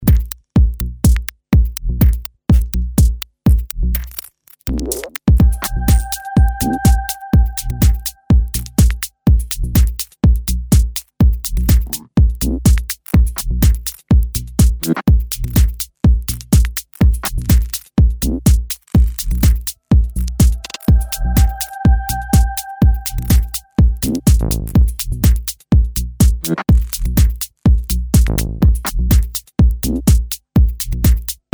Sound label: english rain